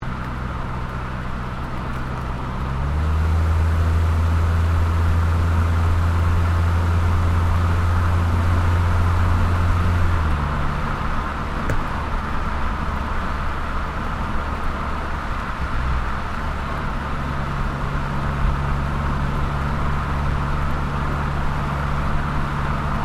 CAR TOYOTA COROLLA DRIVE FAST EXHAUST ROAD LOOP STEREO, hatchback